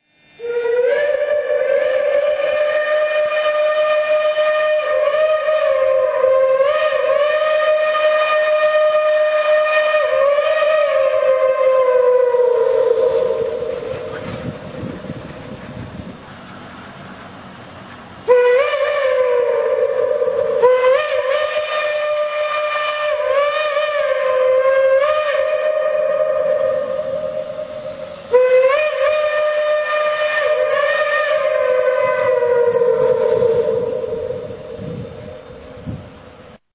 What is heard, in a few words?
ghost whistle, steam whistles